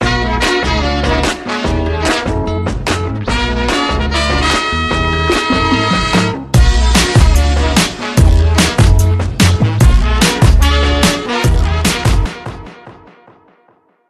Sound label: Jet set; Tecno Spark 7; ringtones